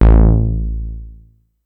Synth oneshot Short Stinger A, Synth Bass One Shots; Slate Digital BASSHOLE Sample Pack